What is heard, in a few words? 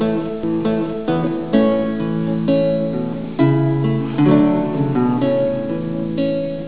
lute